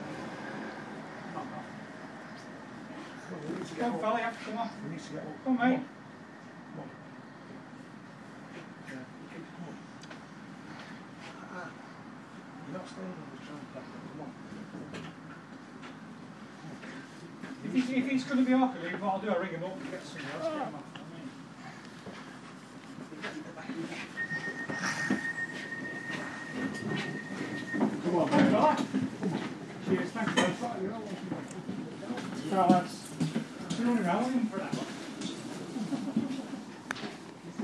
tram of shame metro guards carry a clubbing casualty off away